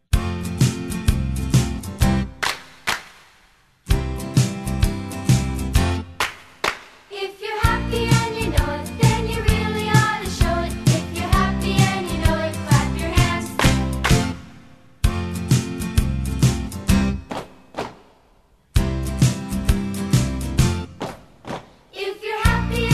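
childrens favorite songs karaoke instrumental if youre happy an you know it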